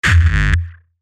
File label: Kick